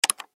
Button Switch Click, Button Switch Click Multimedia; Web Interactive; Technology; Blastwave